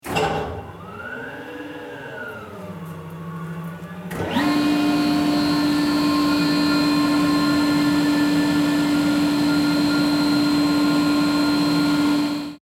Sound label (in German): Kompressor